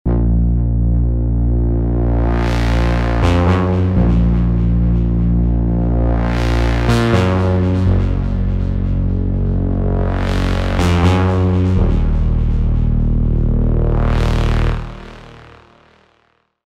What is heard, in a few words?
Melodic Techno